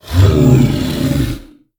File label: minotaur cry; Crypt of the NecroDancer